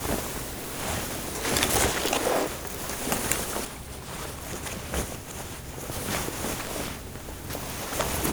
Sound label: stop bleeding loop; Call of Duty Modern Warfare